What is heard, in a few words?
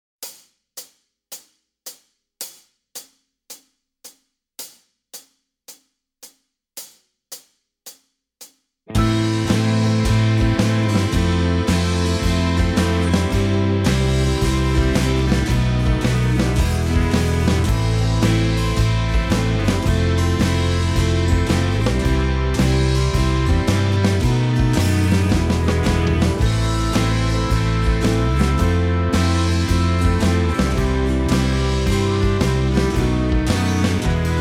Backing Track